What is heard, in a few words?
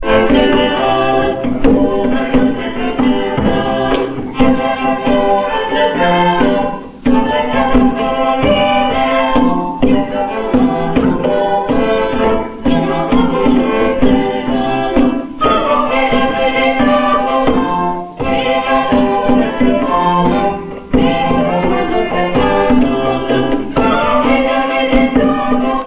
pidliccato